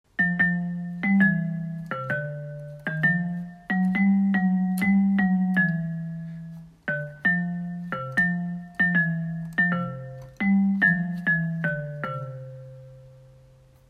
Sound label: Loop